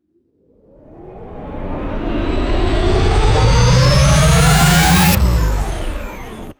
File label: synthetic sci fi turbine power build up; magic scifi